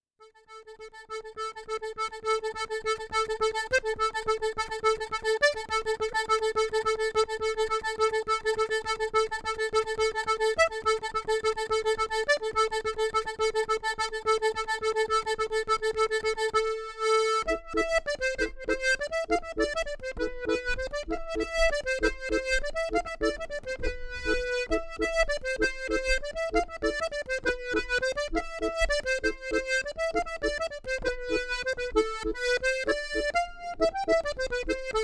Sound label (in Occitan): acordeon